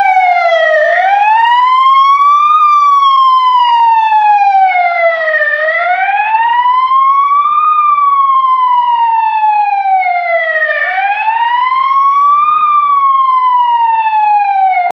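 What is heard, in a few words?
police car alarm; vehicles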